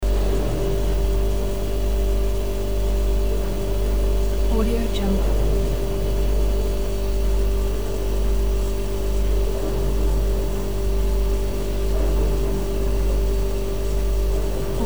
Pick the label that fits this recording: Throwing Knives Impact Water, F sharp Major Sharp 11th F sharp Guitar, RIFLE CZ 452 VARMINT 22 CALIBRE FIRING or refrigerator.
refrigerator